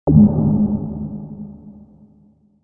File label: Space Style Ambient Noise, Eerie; Sci-Fi